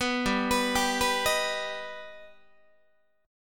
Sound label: G Augmented, Guitar; G+ arpeggiated; 14,15,14,17,15,14; JGuitar